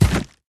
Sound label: phoenix fire egg